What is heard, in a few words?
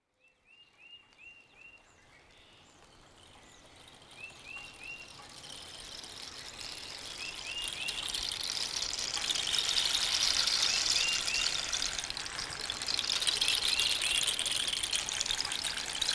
rain, gourd orchestra